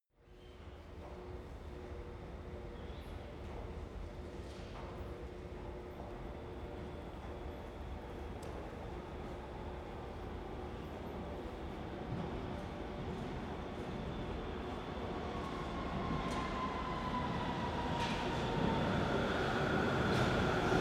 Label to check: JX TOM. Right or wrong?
Wrong